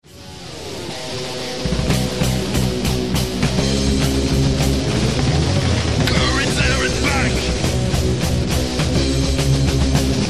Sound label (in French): basse chant